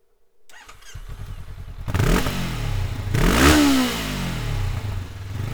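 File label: Slip-On, exhaust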